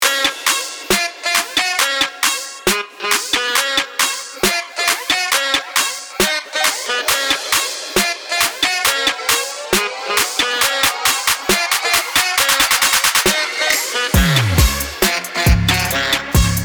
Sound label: Cosmopolitan Sax